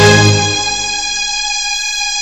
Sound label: ENSONIQ PERC STACKED STRINGS